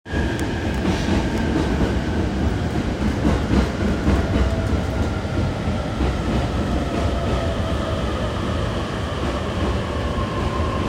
JR東海道線平塚南

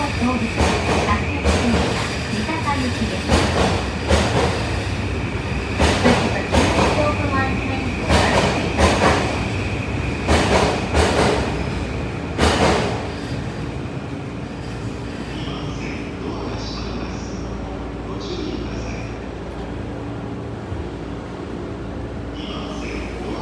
JR中央・総武線三鷹西, train sounds

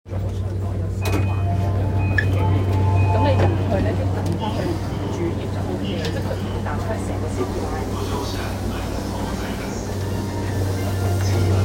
JR 山手線 池袋 CW, train sounds

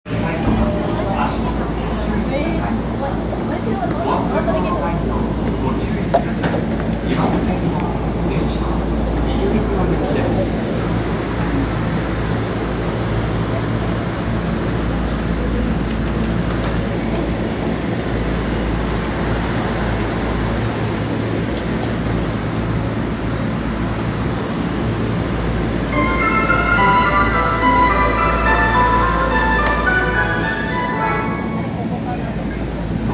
丸ノ内線東京東, train sounds

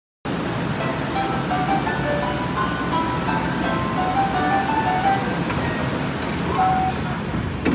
桜宮発車, train sounds

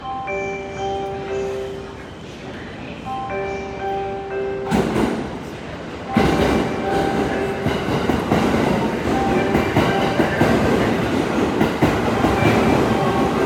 JR湘南新宿線大船接近

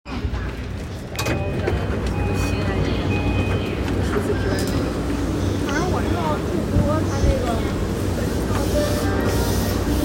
JR山手線渋谷CCW

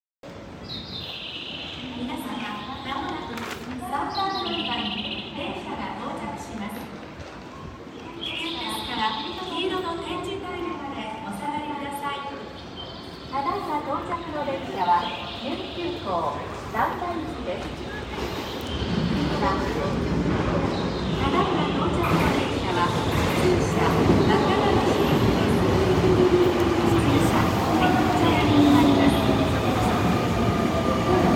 南海本線新今宮南予告

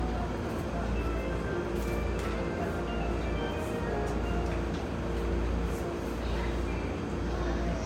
JR武蔵野線吉川東, train sounds

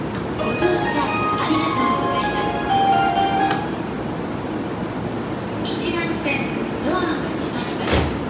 京葉線南分橋東, train sounds

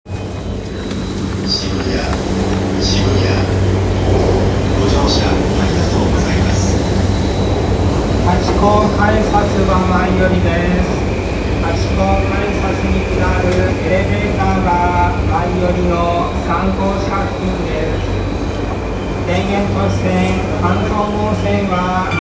JR山手線渋谷CW

